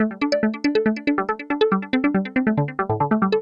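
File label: synth electro 140 A; Techno; Keys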